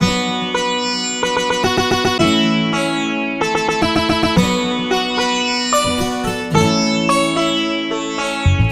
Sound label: Melody Loop BPM 110 B Min; Gully Loops; Mahi